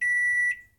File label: microwave beep; Golden Crayon Sounds